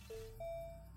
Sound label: Bing; Astroneer